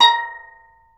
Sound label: A#4 TENOR, STEEL DRUMS; AKAI S6000 CD-ROM; WorldSounds